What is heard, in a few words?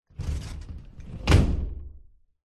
door sliding glass close